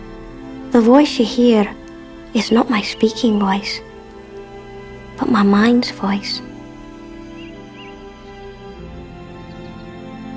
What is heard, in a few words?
piano